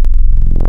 Classic, wavetables, Analog; Massive 3-Analog-Electric

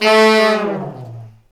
BRS LNG FLA, Section FX Fat Falls; Roland L-CDX-03

